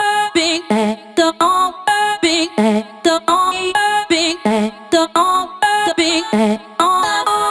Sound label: House Loops, Vocal Loops House 128BPM